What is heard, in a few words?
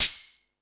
FX Sounds, Drum Machines E-MU Pro-Cussion